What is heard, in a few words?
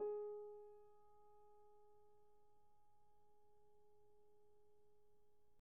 piano pp, steinway